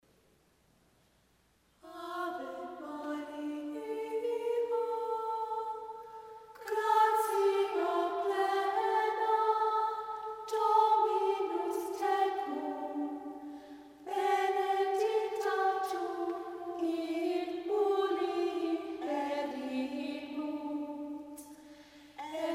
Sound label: Ave Maria Gregorian chant